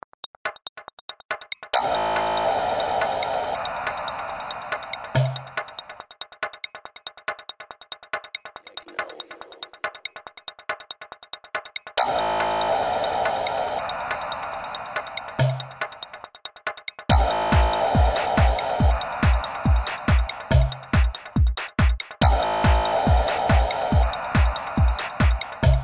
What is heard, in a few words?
Techno